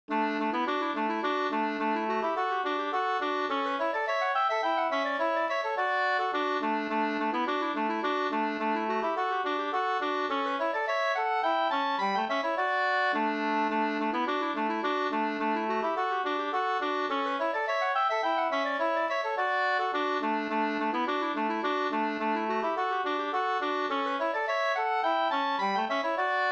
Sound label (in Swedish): slangpolska Skraddarpolska 2st